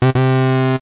buz

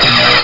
ERROR; VirusExecutor

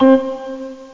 drawbar organ; OctaMED SoundStudio V1; mirage

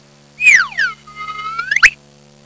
slide whistle

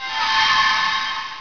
enter dungeon; Exile III v1.0

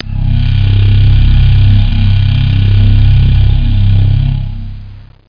eerie; Duke Nukem

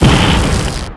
bomb explode; Dreamstars

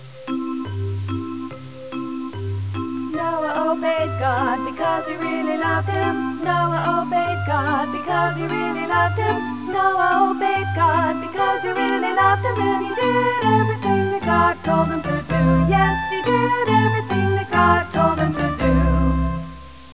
SONG; Kids' CD-ROM Bible Fun Pack; Education Publishing Concepts; NOAHDEMO

